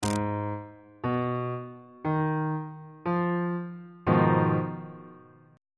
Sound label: f half diminished 7th 1st inversion